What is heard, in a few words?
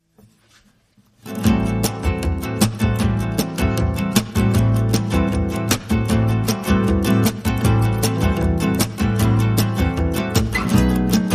No Drums No Lead Guitar